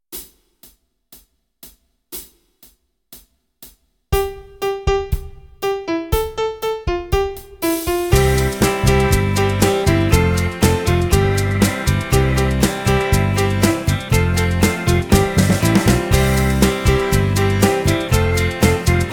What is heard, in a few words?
keyboard; Kenneth Baker - The complete keyboard player